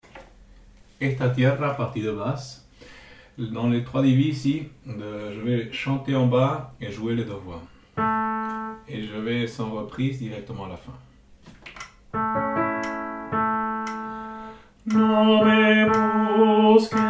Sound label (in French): Basse